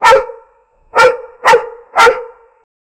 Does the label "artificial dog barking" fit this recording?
Yes